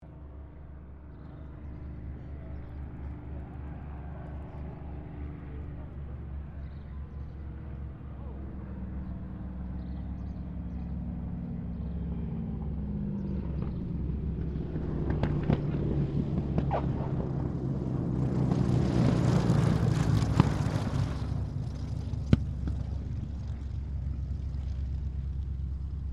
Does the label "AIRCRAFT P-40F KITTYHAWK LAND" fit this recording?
Yes